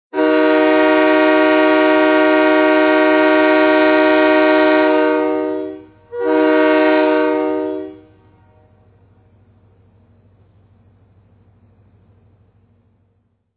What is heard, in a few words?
Two Horns